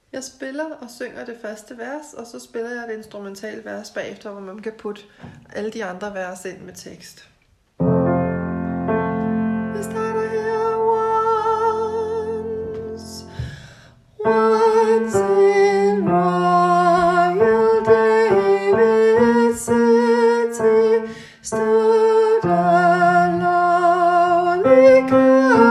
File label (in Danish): tenor; Once in royal Davids city; synges af 2. alterne